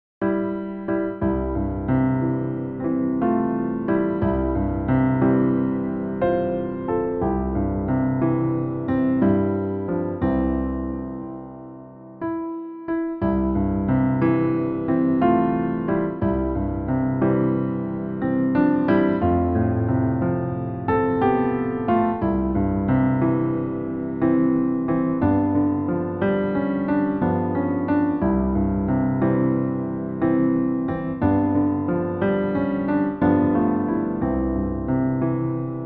piano solo

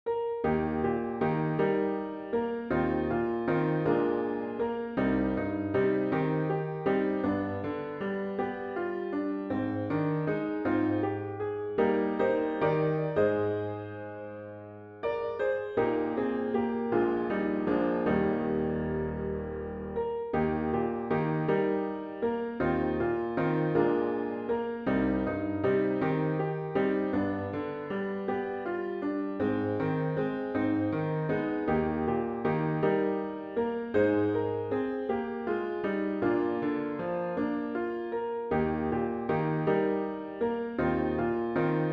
enhanced piano

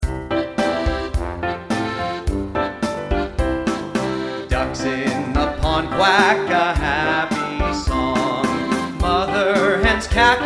vocal

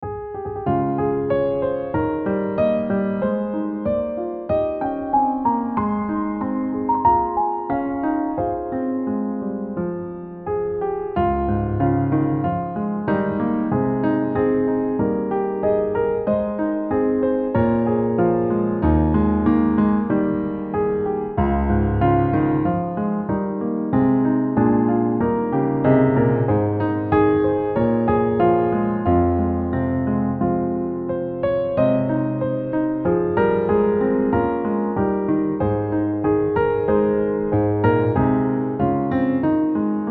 piano solo

